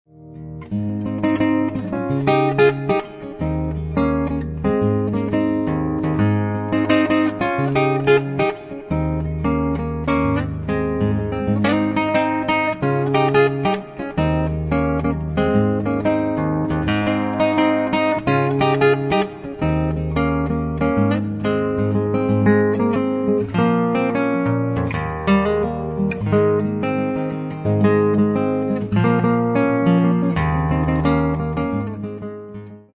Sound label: lo jam